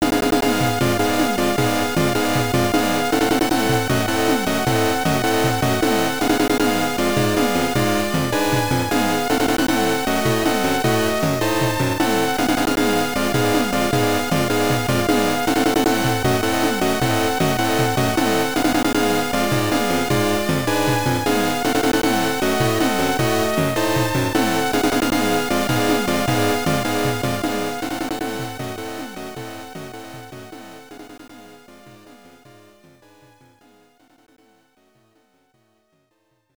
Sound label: Battle Ship; Side Arms Hyper Dyne; turbografx 16